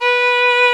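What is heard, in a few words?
viola and violin, string quartet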